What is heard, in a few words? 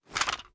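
assault rifle reload empty shake; weapons; gmod; viper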